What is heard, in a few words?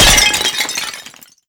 break pottery; physics; breaking